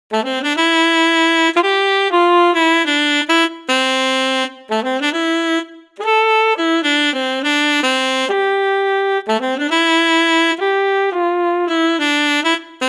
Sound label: sax; knuckles